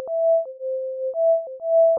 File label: melody